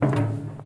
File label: footsteps metal walk